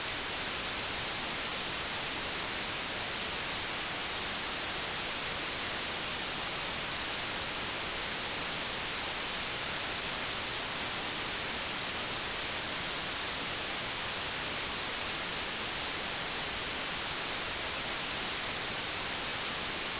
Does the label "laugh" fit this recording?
No